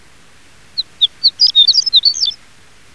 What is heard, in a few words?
Eremophila alpestris